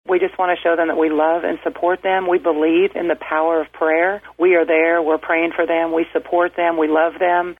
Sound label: Car Honk; bartlesville community honors medical workers friday night